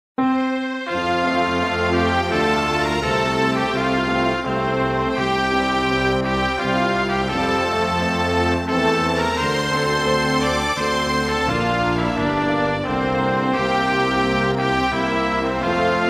Himno de Burkina Faso

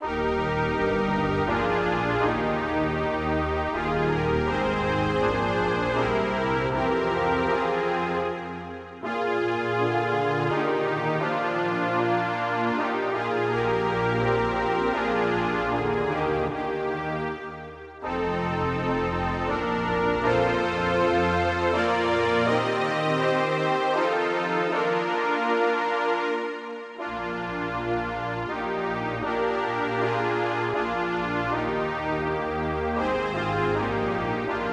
Himno nacional de Austria

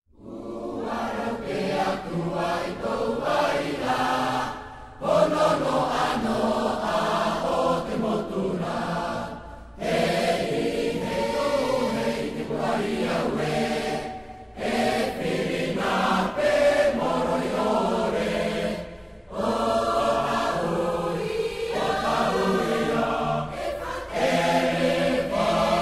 Himno de Polinesia Francesa; Ia Ora O Tahiti Nui

